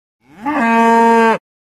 Cattle, Cow Moo, Bellow. Single Large Moo Bellow. Close Perspective.